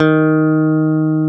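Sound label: Bass Electric; CMI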